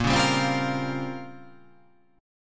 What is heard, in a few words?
A sharp Minor 6th Add 9th A sharp down, chord